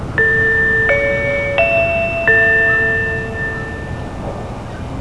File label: chime; 「ひかり」途中駅チャイム